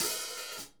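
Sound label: hats open, Drum Kits; Sonic Reality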